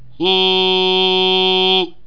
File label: resonator oo